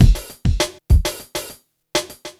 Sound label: drloop, drumloops, Vinyl Attack; Masterbits